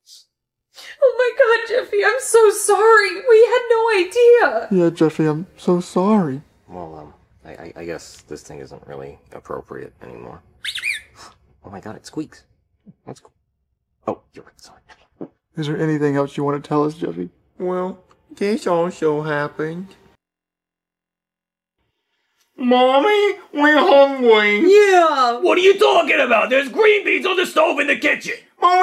vocals

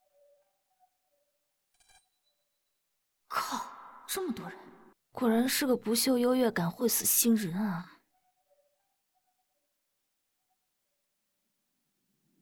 vocals

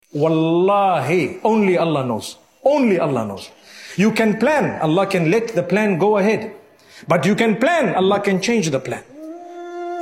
vocals

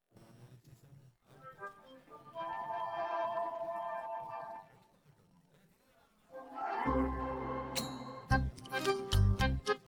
happy no vocals

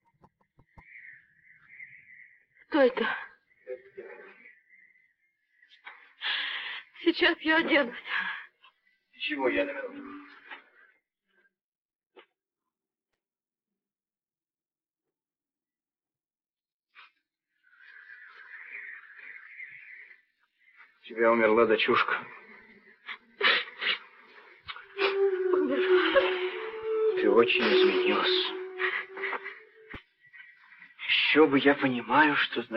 vocals

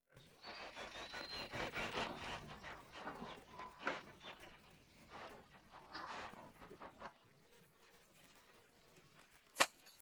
happy no vocals

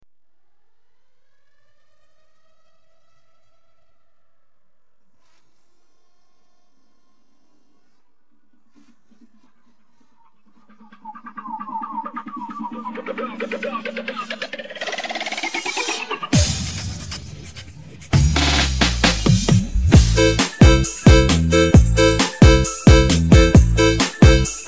sahur no vocals

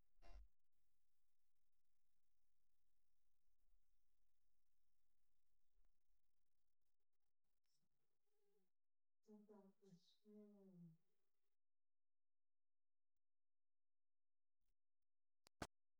vocals

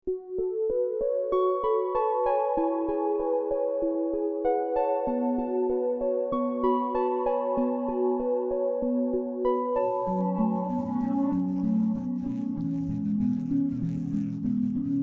no vocals

